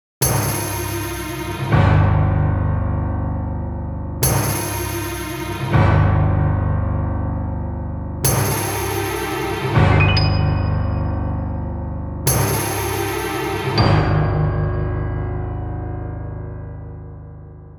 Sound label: Fine Rain, Mystery